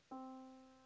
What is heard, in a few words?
yamaha Disk